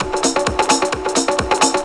drum 130, techno trance drumloops

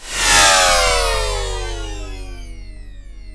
SCI-FI SWEEP

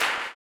CLAP, blues & soul; ILIO; Vocal Planet

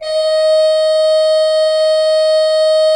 Cathedral Organ, HOLZGEDACKT, D4; Propeller Island

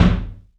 ROOM KICK; 3D Drums

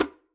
tom slap p, hand drums; SoundBytes - Early Patches

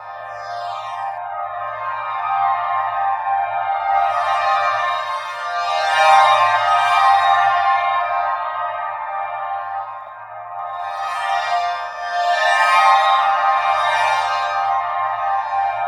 TRANSOLIA; Spectrasonic Distorted Reality 1; GLISSOLIA

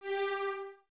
Violins Detache G 4; Miroslav Vitous; String Ensembles

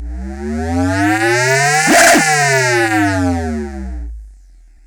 DROP; E-MU Formula 4000 Series Vol. 2; Effectors

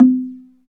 Slit Drums, Africa, Wood & Metal

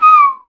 bird calls, percussion

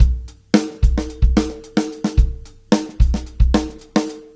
Live Beats, R&B Drums

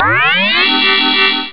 indy alarm; ST-XX Amiga Soundtracker Sample Packs

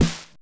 Snare, Genesis; Streets of Rage 2; M8

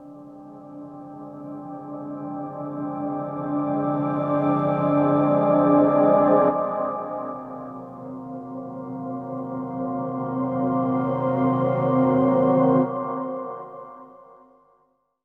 Fearfull Pad, Pads; Zero-G Smoke

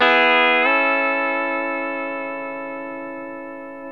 E TO A, Pedal Slides, Earth Tones

